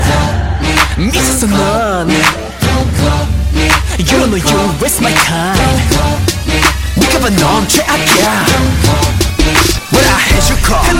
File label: Ringtone